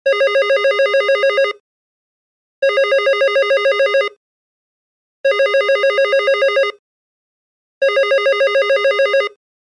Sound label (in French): sonnerie fixe; Sonnerie tél. fixe